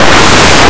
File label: med bag